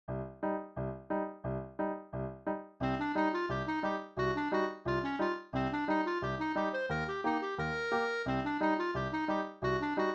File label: in the hall of the mountain king clarinet solo